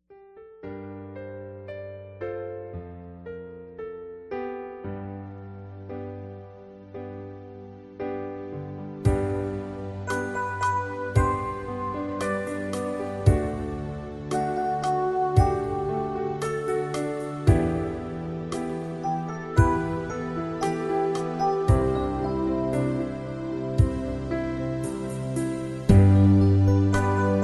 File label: Instrumental Foxtrot